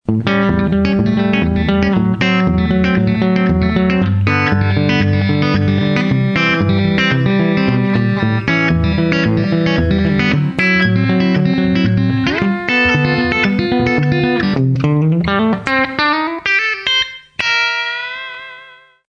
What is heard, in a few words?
bridge clean; blues engine; ainsley stones; axetec